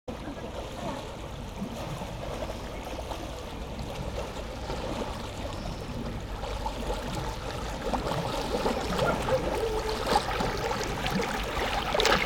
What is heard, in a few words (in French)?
jingle